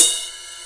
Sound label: ride bell; S929 win 98 driver